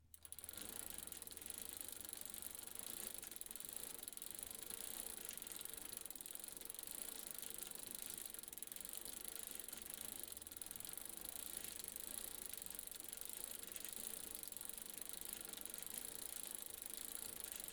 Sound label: Cycling